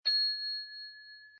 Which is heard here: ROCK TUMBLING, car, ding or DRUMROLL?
ding